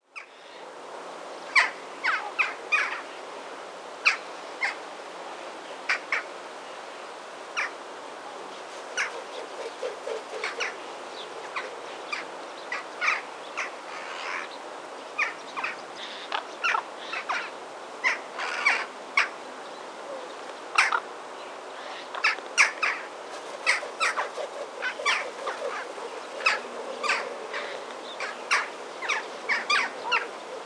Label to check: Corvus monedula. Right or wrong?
Right